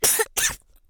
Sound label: Poison Cough, HillBilly; hedgewars